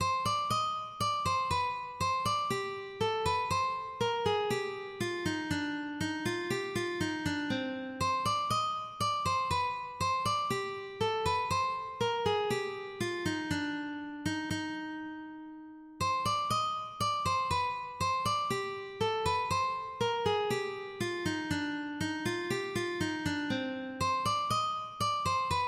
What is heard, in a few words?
bourrée, ukulele; bwv0996